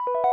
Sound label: question mode; TeamTalk V 5.5 Portable